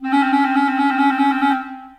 Bb Clarinet Solo Half Step Trills; Ableton; Orchestral Woodwinds

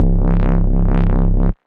Lowdam, Analog Bass; Ableton; Factory Packs; Retro Synths; Analog Bass Vol 1

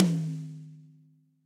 Tom Mid Bright Room; Drums; Drum Hits; Ableton; Session Drums Studio; MPE

